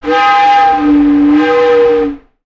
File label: sound design effect air pipe pressure